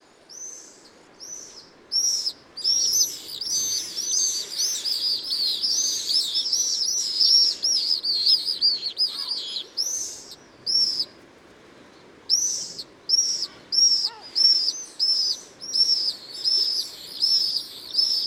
Male and female duetting at dusk

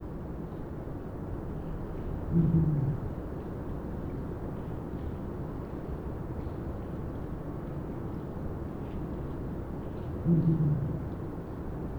Turkish Fish Owl Hooting Of Male

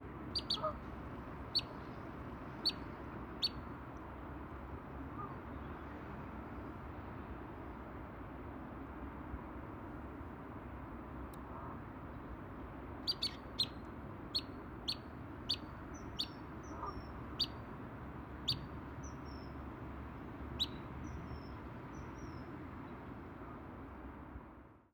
Calling First Winter

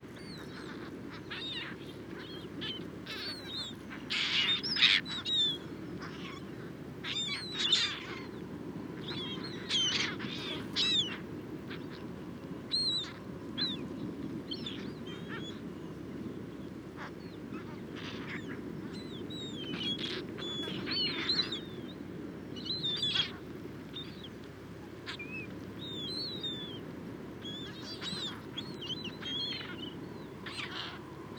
Mediterranean Gull Juvenile Flock Feeding

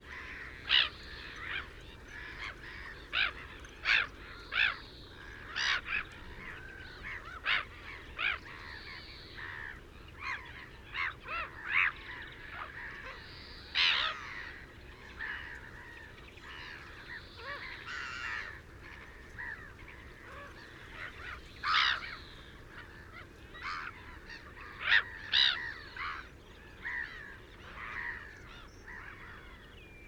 Black Headed Gull Calls Of Socialising Adults

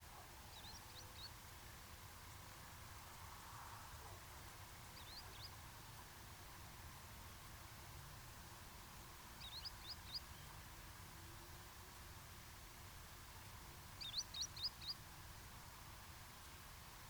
Green Sandpiper four several; rne Moors

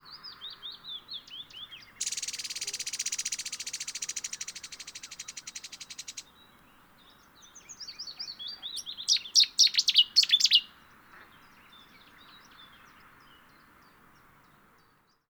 rattle odd song

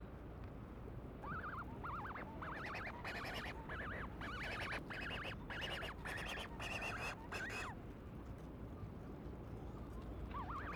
Scopolis Shearwater

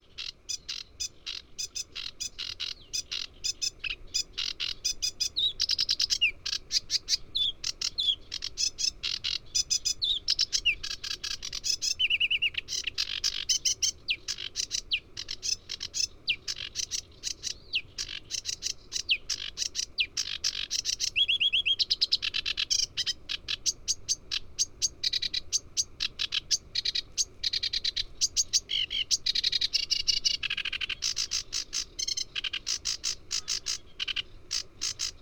Song

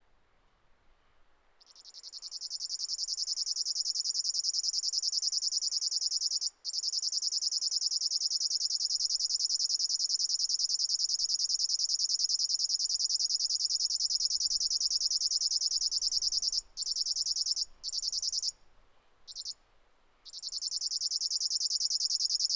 River Warbler

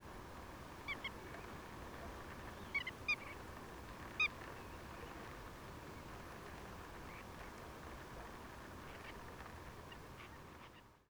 Barwits with scratchy calls kep; Portland

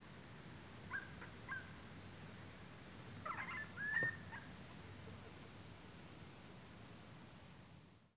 quip

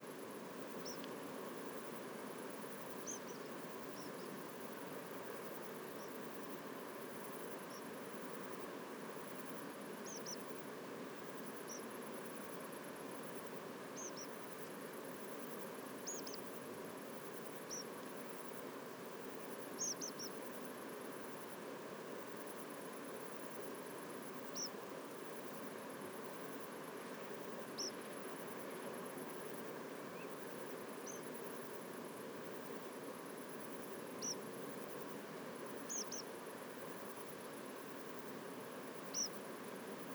Tree Pipits; Portland

